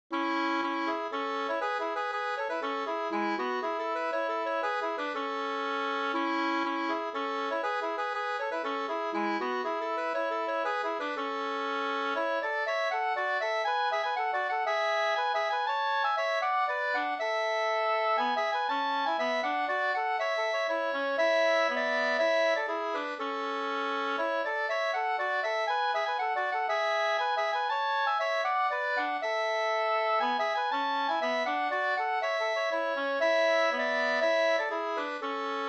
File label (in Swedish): polska springlek